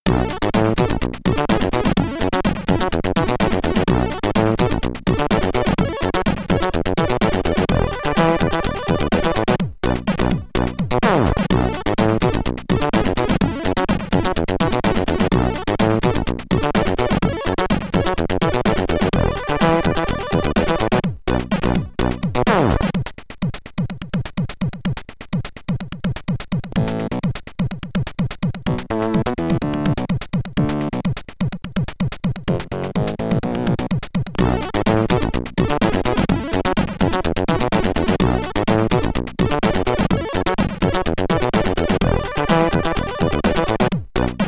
BGM, SID Stereo, commodore 64

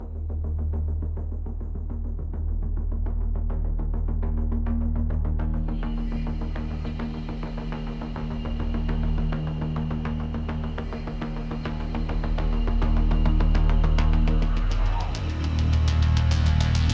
PVP Main Ambient

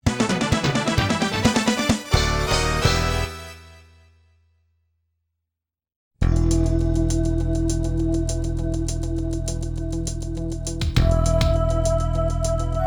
Game Clear; Langrisser V Remastered Soundtracks

